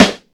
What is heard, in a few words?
clear snare drum g key, steel snare drum tuned to the G note; snare drum samples